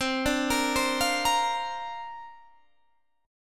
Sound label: D-Minor 7th Sharp 5th C, chord